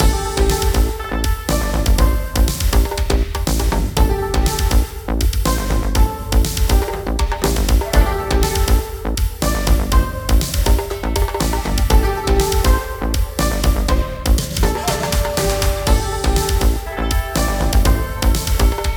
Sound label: Credits Background Music; Itadaki Smash